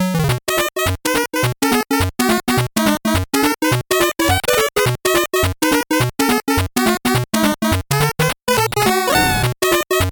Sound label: 8bit bgm fast